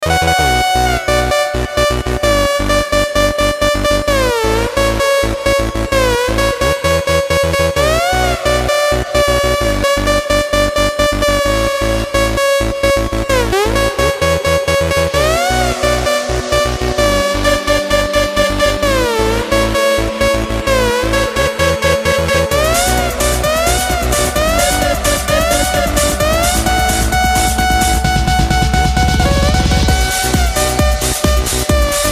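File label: luchshaya klubnaya muzika, best club music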